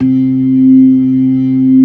LOW MAN, organ combos; Keith Emerson Signature Series

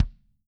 BD MF R, KICK; Living Drums; Sampleheads; Peter Erskine; PB KICK+TOMS

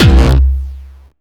Hard style kick

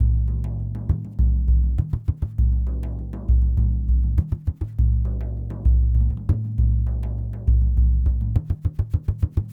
Lg Frame, World Percussion

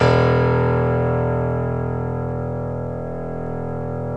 GRAND PIANO; OMI - Universe of Sounds

